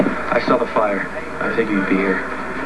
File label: fire